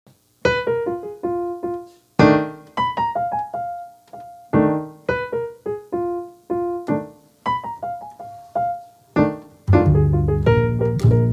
Blues

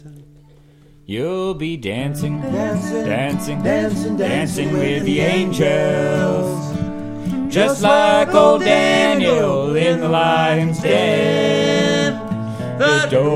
dancing harmony key

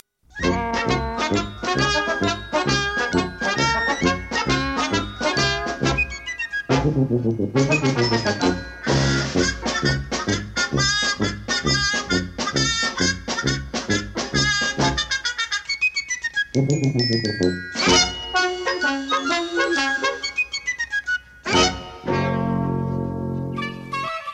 Cartoon INTRO HAPPY

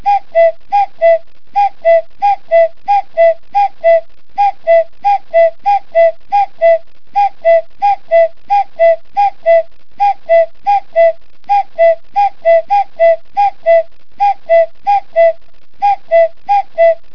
cucoo

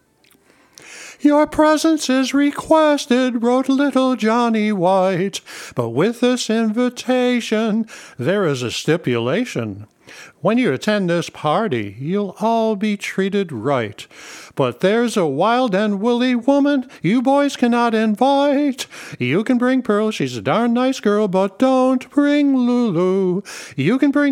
Vocal One Amp

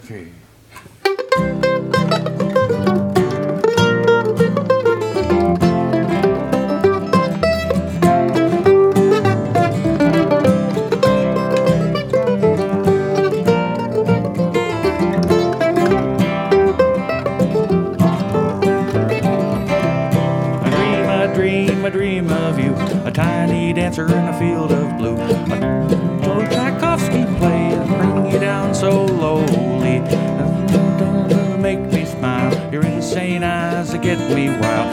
Key C

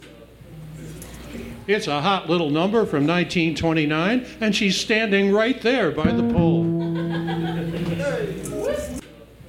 CUTE LTTLE SLOW

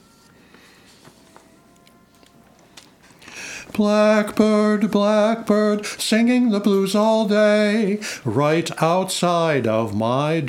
Vocal By Bye Three Amp Amp

